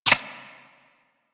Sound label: Ricochet; Warframe; Cowgirl